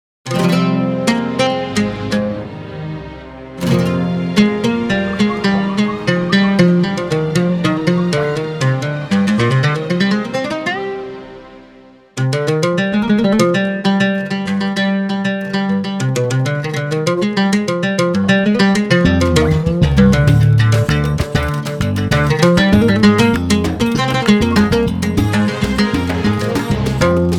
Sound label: Arabic Oud; Trailer